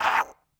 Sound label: bird collision low; Angry Birds